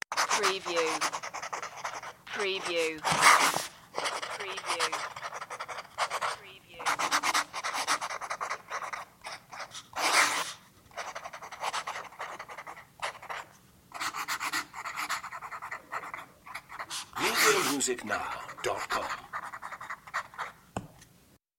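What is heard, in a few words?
Writing Pencil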